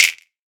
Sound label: Snap; Snaps